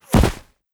bullet impact snow; Rust

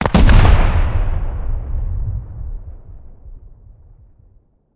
satchel charge explosion; Rust

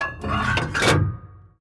gun reload; World of Tanks

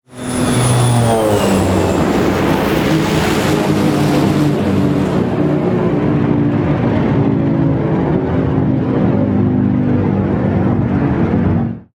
damage vehicle; World Of Warships

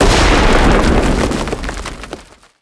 building hit; tanks; War Thunder

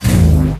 torpedo; World Of Warships

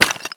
sprint tile; Escape from Tarkov

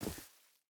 footstep rubber boots grass run; Rust

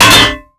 dest hydrant land

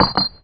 grenade bounce stone; weapons; Modern Warfare

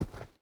footstep rubber boots dirt walk; Rust

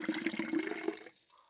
bota bag fill container; Rust

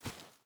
footstep barefoot dirt walk; Rust

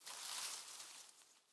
leaf rustle crunchy med

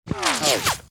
damage vehicle; World Of Warships

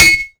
iron small

